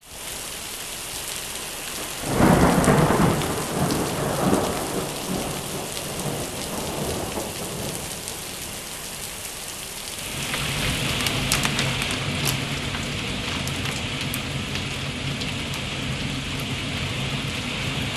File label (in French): Orage